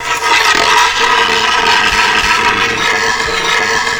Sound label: Ribs Stn Roll Lp